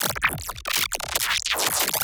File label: Funny Neuro